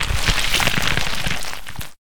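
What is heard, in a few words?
picture undead golem death, monster; thedead3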